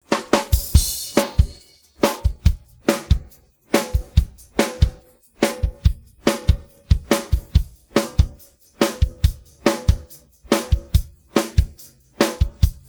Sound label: pattern, drums